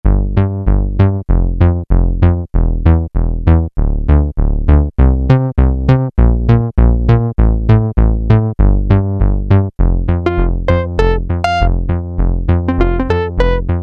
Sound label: Prophet Bass, Peavey DPM Spectrum Bass